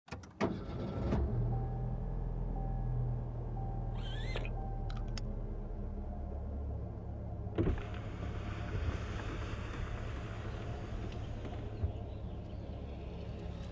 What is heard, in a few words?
Starting Car